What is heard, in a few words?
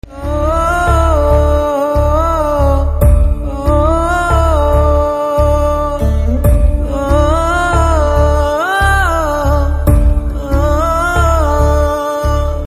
Humming Ringtone